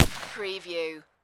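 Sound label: Pistol Ruger 357 Single Shot Exterior At 45ft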